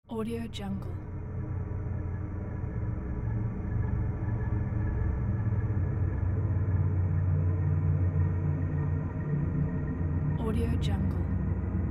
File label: deep suspenseful background ambiance; pashastriker